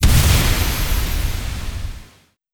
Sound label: head crack open; gg radiance